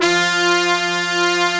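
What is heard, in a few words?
BRASS F2 left; AKAI S-Series CD-ROM Sound Library VOL-2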